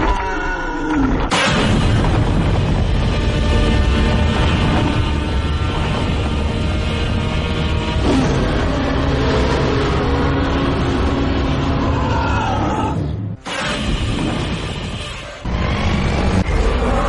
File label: godzilla roar